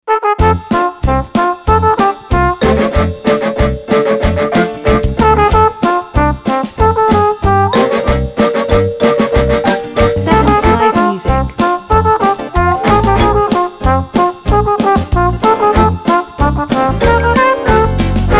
Big Band

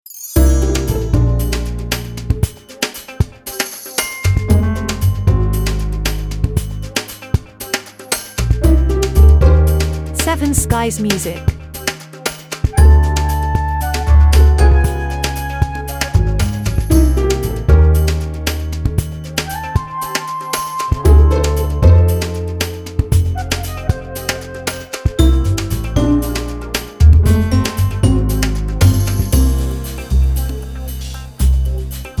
Light Comedy

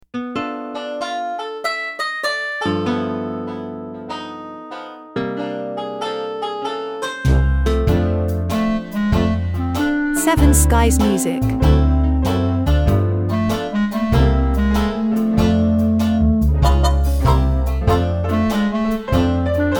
Dixieland

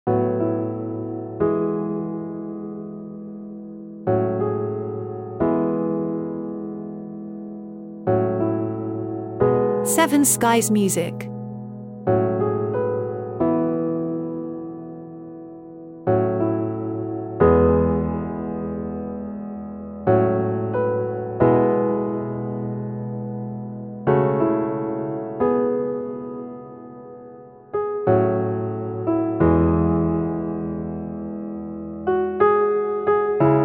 Solo Piano

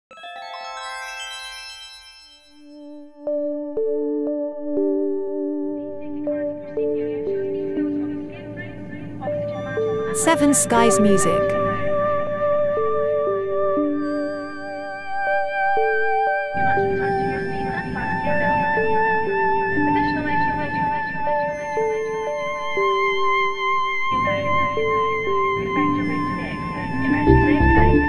minimal electro strange psychedelic

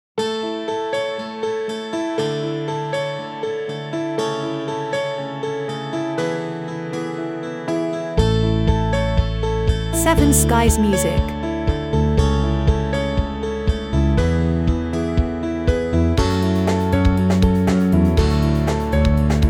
Positive Pop

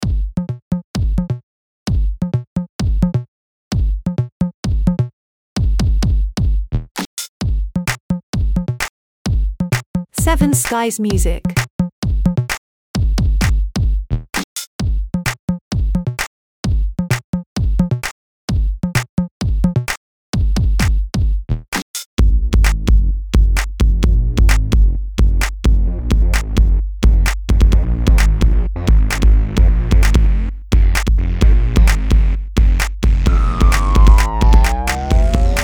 Electro crazy

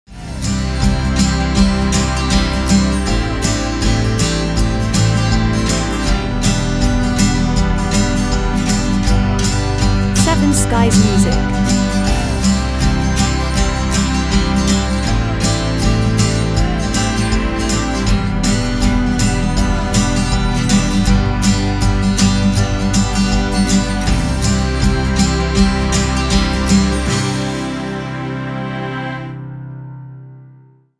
Acoustic Love

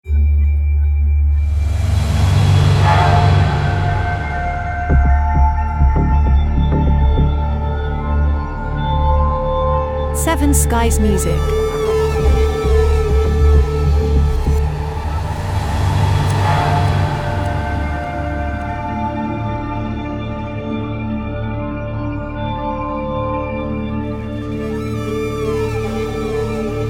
Ambient Dark Film Series

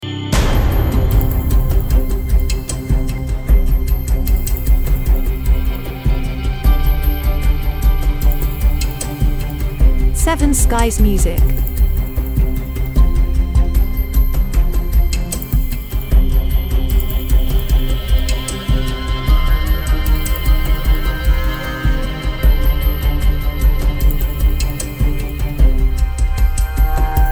Ambient Suspense

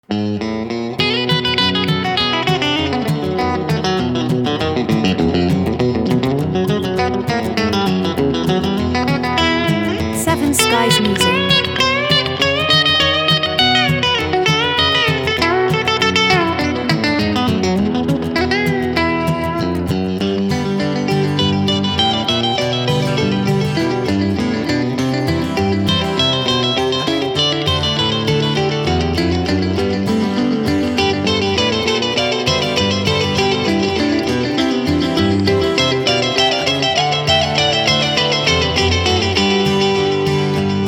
Foot Stomp Blues

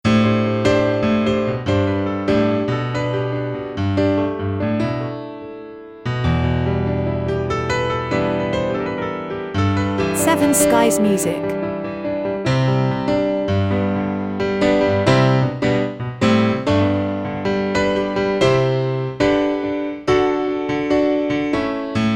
Jazz Piano improvisation

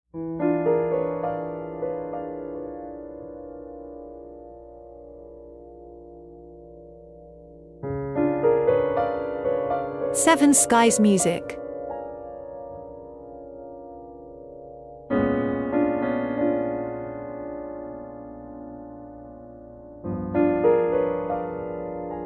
solo piano

